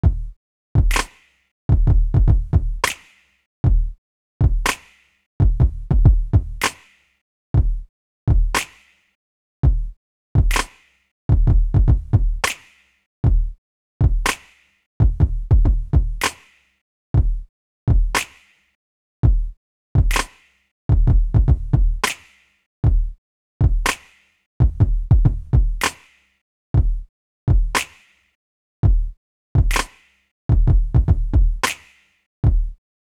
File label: beat 100